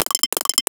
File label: tabra, Akai MPC2500, drum machines